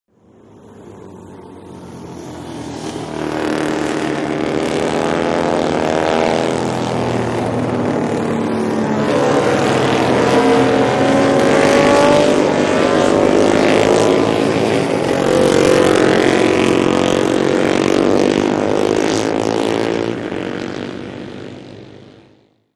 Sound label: Motorcycle Road Racing